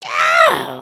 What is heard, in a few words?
collapse; emotes